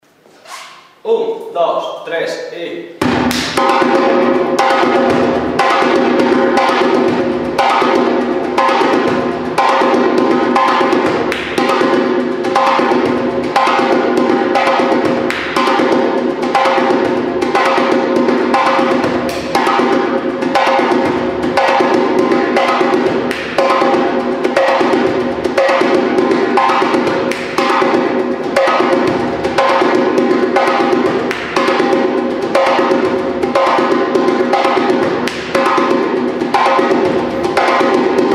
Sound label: timbao